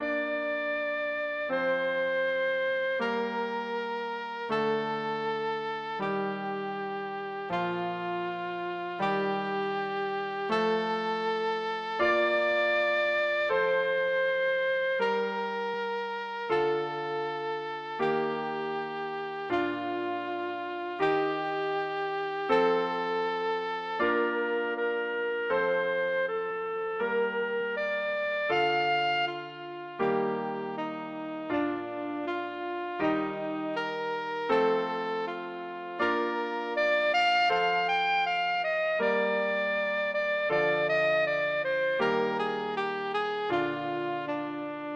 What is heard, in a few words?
saxophone